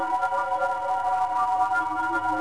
crystal; The Elder Scrolls III Morrowind GOTY; XBOX

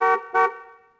car horn; Tony Hawk's Project 8; XBOX; houses

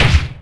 boat hit; Splashdown; XBOX; Impact

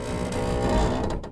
metal creak medium

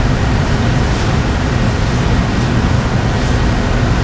process, ambience; artus; Star Wars Jedi Knight II Jedi Outcast; XBOX

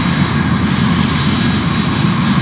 jet; World Racing 2

